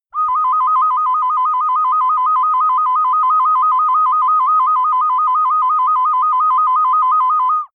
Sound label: ocarina trill